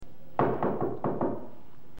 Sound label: door knocks